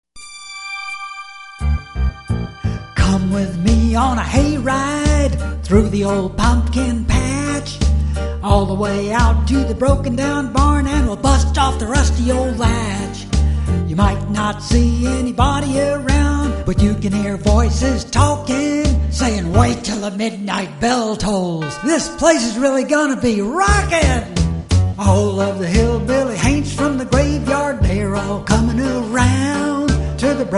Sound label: hoedown